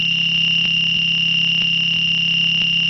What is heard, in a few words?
scroll number